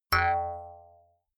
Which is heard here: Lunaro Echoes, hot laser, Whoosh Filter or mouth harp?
mouth harp